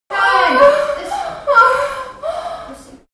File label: birth mother moaning